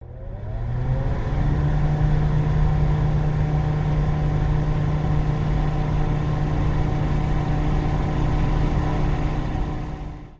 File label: Hover Craft